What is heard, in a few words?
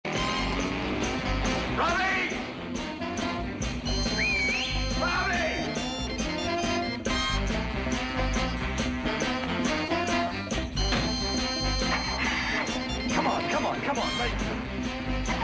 music